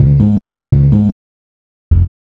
B LOOP

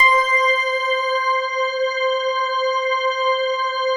PAD; Best Service; XX Large; Pads

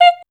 F5, Voice

